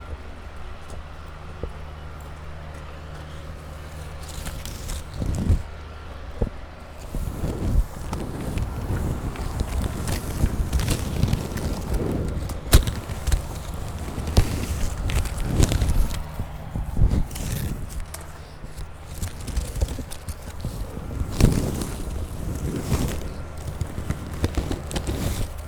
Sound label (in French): sol gravier croustillant; campus universitaire de la bouloie